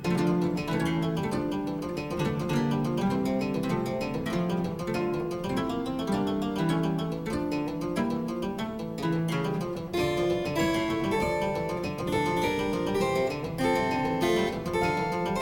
Suite in D Minor - Variation, Harpsichord; Suite No. 3; HWV 428; Handel; Suites for Harpsichord

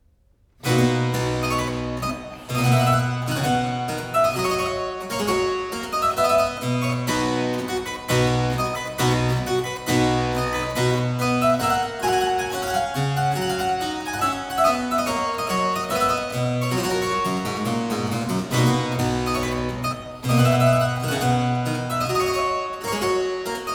March, harpsichord; Complete Chamber Music; Brilliant Classics; Miscellaneous pieces for the harpsichord

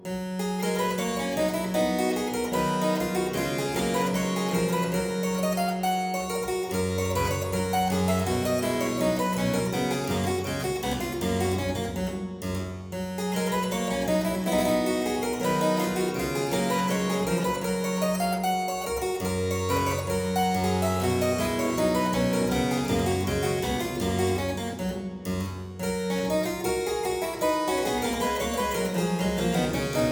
Aria in G major Partita 23, Harpsichord

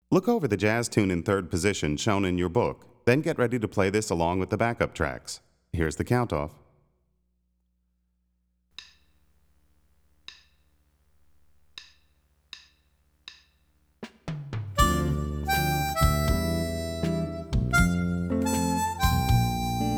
Jazz Tune in Third Position, harmonica; Play Harmonica

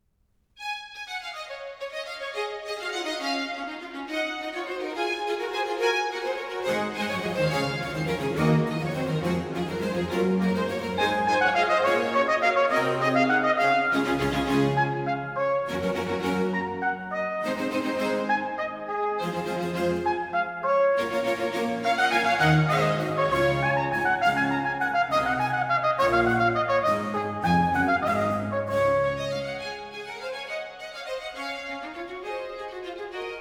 Trumpet Sonata In D Major - Allegro; Purcell edition

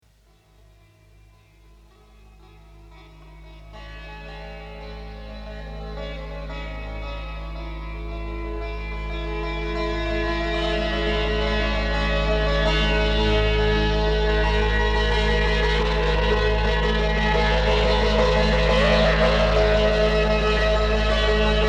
Far Sitar; Early Daze Vol. 1

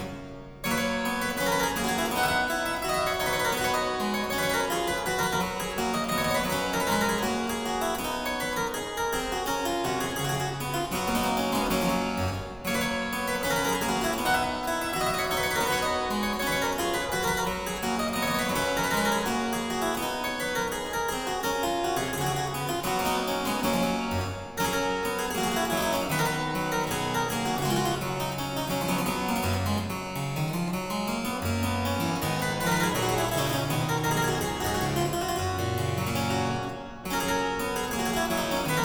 Aria Variations in G Major, Harpsichord; La Capricciosa; BuxWV 250; Buxtehude; Opera Omnia; Harpsichord Works

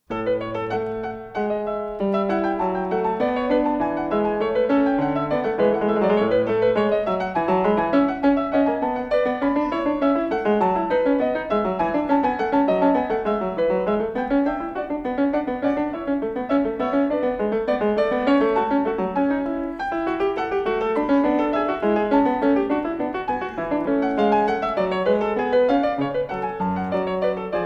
Prelude in A Major, Clavier; Prelude & Fugue No. 19; BWV 864; Bach; The Well-Tempered Clavier I

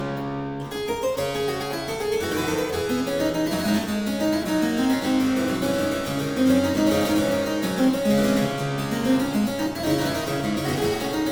Suite in D Minor IV. Double, Harpsichord

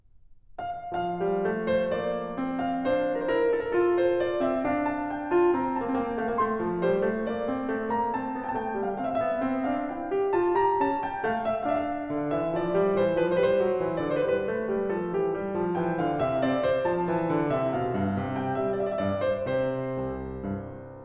English Suite in F-dur - Courante, Keyboard; English Suite No.4; BWV 809; Bach; Keyboard Works

